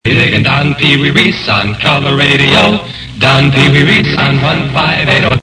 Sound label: jock jingle